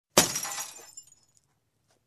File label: Glass drop and shatter